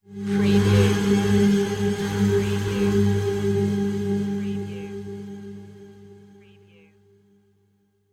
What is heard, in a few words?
disharmonic ghost flyby